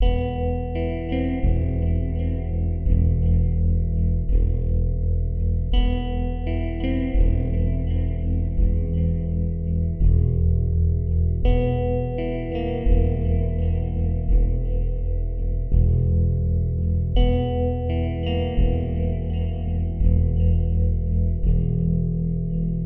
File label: Dark Transition no perc